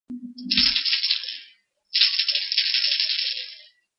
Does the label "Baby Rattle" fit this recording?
Yes